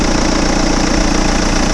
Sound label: opel astra, vehicles